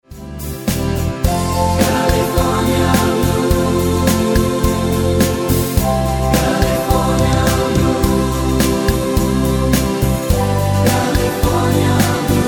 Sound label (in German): mit Chor